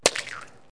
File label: hit spin, impacts; Wired for Sound Pro CD; Aristosoft, Inc.